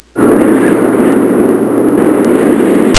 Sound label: Wind Howling; Soundsation; Nature